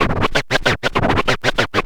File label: scratch short, Vinyl scratches